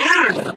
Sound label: ballbot short impatient